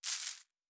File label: Coins; Fantasy Sounds Bundle